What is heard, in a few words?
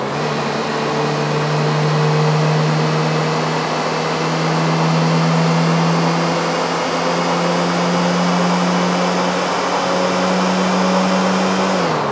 fourth, vehicles; landstalker